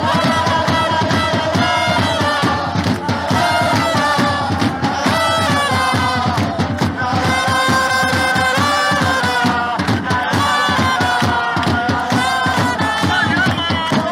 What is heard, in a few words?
best pisa chants